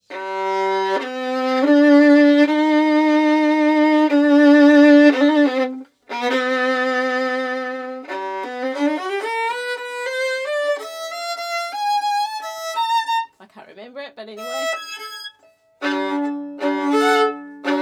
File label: Modern Bow Modern